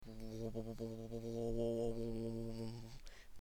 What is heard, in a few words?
Engine; Assemblee SFX